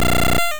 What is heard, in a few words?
Ice Beam; sfx; attack moves; pokemon; gen 1; rby